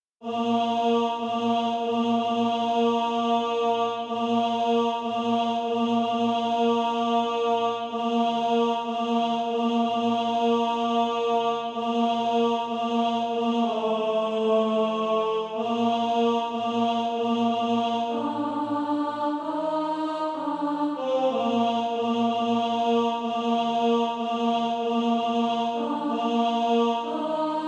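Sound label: tenor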